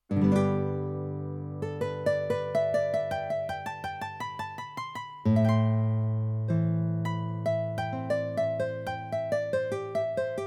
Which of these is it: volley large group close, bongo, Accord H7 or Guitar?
Guitar